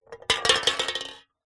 foley cans soda 200ml 2x empty knock over on concrete; zapsplat